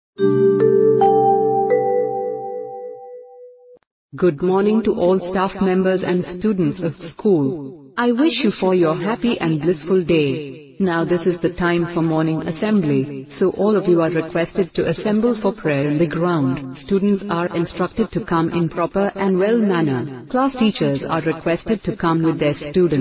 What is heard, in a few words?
bell